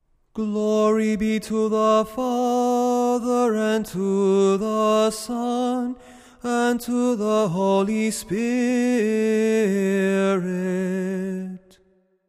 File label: Tone 8 glory be